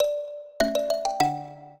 minuet, mbira; Mozart

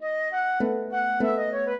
minuet, flute harp; Mozart

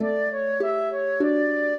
minuet, flute harp; Mozart

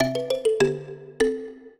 trio, mbira; Mozart

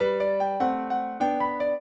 trio, piano; Mozart

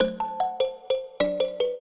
trio, mbira; Mozart

